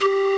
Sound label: PIIKS